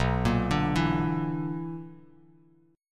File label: B-Minor Major 7th Double Flat 5th, Bass Standard; BmM7bb5 arpeggiated; JGuitar